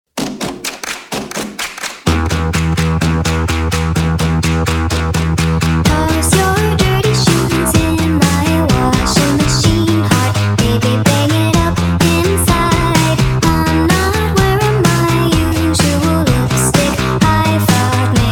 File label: sped up